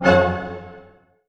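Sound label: C minor pianissimo, orchestra hits; Sound On Sound - Peter Siedleczek's Orchestra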